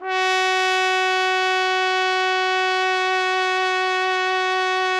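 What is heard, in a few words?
trumpet; Roland - LA Composer vol. 3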